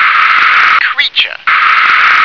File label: creature